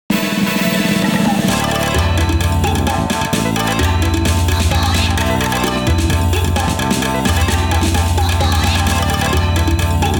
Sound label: Jingle Vs Result; Taiko no Tatsujin Drum 'n' Fun